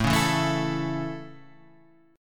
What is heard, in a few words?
A Major A, chord; A Chord {5 4 2 2 5 5} strummed